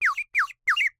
Bird whistle, clay, painted, water, airy, wispy, rise fall, quick, quiver, 3 chirps; Eiravaein Works; Vaeyan IV